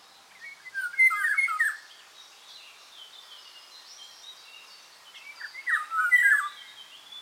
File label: Oriole song, Pirol, birds